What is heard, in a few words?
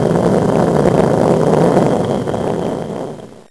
Landing; StarBound